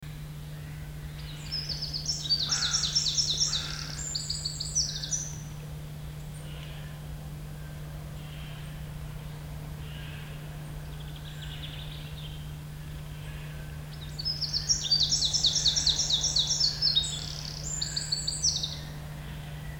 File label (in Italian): Scricciolo canto, Boschi del Gargano; marzo 2013